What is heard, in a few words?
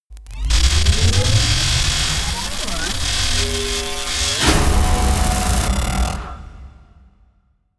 electricity; comedy cartoon